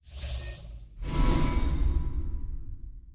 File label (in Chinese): 球员升级 页面转场; 升级 装备 技能; nba